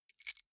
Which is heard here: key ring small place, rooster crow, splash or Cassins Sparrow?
key ring small place